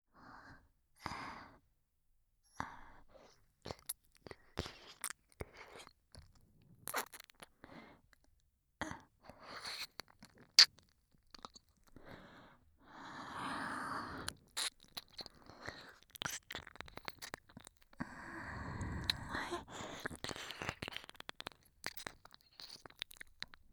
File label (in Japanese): 耳舐め, ASMR; SEなし